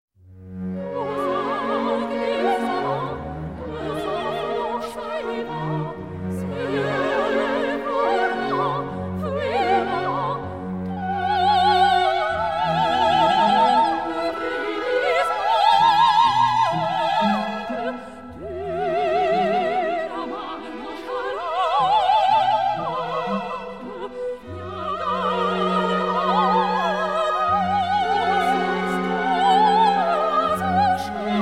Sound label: Flower Duet (with vocals); Ren & Stimpy